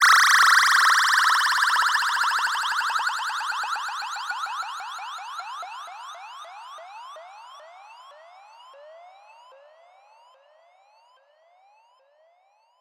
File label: Blip Sweep